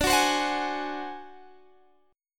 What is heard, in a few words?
D 7th Flat 5th D down Guitar Standard; strummed; capo 9